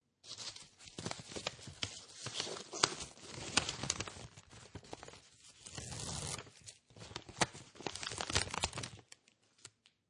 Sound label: Envelope Close, Put paper in envelope, self-seal envelope close; Classroom (Sound Effects:Schools)